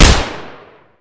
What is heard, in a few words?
Deagle